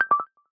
In Call Buzz; Lumia Ringtones

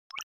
accessory connected; MeeGo Ringtones

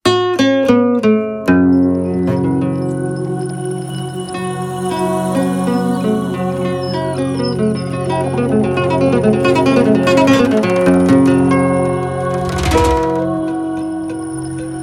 Ring, ringtones; Lumia Ringtones

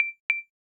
message in call; MeeGo Ringtones

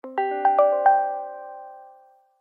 Alert, ringtones; Lumia Ringtones

